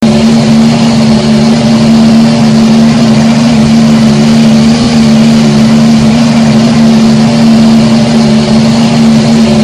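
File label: exhaust idle; AOS F1 2022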